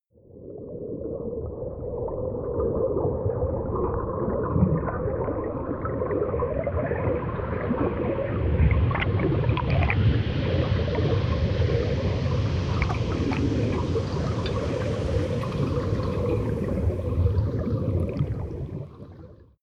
water fx

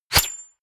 golf ball hit

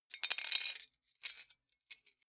piggy bank coins put in

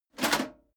metal plate armor movement single

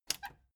plastic button latch squeak click enable fast

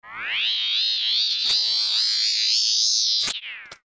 id scanner

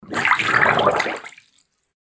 water swash bubbling

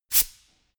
pneumatic pump quick burst

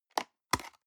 plastic box food small close medium

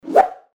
fight swish medium high fast

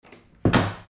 door

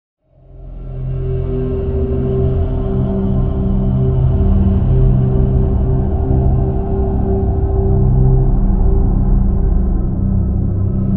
morbidity drone

